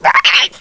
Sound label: talk, headcrab poison; hl2